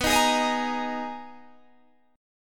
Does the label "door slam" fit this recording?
No